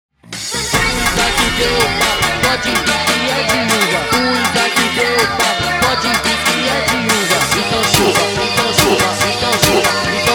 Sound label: Christmas Kids Funk sped Up